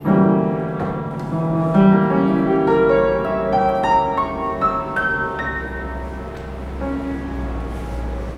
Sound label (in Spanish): Loops mutantes piano cama